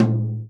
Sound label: tom, Real Drum Kit, jungle kit